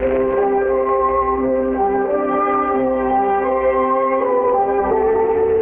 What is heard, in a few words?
strings, loops, phonky